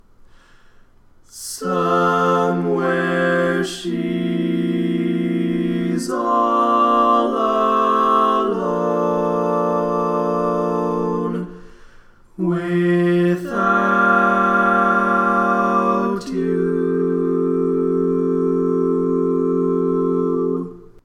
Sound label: barbershop tags, all parts